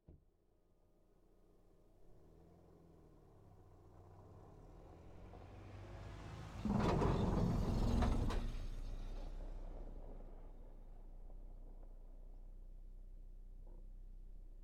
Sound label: between reynihlid and vogar; Ristarhlid